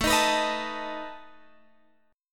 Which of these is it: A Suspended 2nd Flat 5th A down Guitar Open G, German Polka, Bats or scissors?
A Suspended 2nd Flat 5th A down Guitar Open G